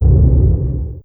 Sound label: rumble